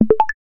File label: fade ding